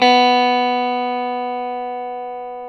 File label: Classic Guitars; E-MU Formula 4000 Series Vol. 3 – Analog Odyssey